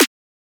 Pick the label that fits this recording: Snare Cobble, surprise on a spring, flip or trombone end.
Snare Cobble